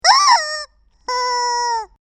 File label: Chicken Bong Sound